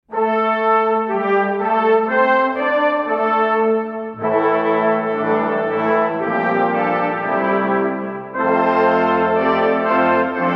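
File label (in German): Bläserzeichen; posaunenchoere u blaeserkreise; Fuer Den Kirchentag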